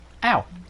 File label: "ow"